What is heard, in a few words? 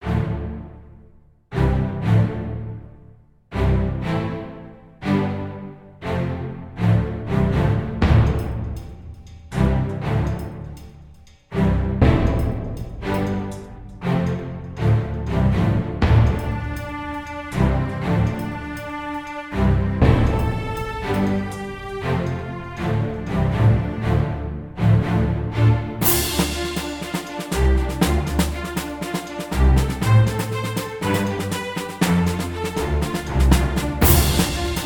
Menu Music